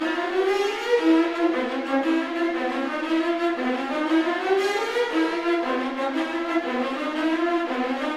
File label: GRO, Orchestral Loops; 128 HITCHCOCK